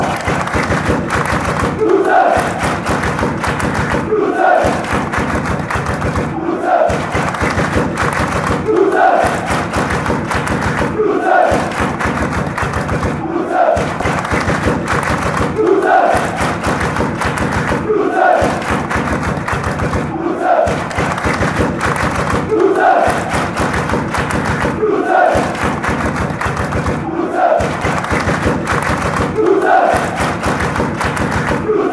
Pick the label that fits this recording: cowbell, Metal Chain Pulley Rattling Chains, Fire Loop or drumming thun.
drumming thun